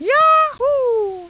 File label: yahoo; 3dball